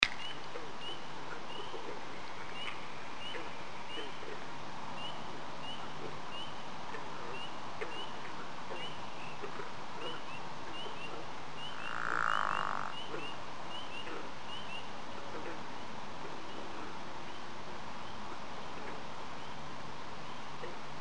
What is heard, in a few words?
bog frogs peepers; orono